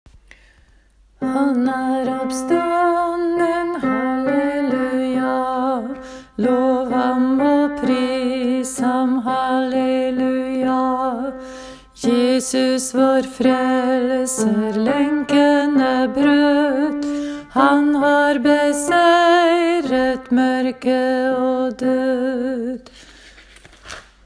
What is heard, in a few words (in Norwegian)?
han er oppstanden alt; åfjord